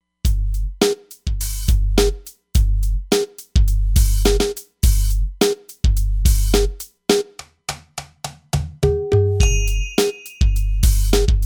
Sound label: Neo-Wrld Kit; Drums